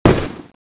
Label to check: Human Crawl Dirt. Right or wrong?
Wrong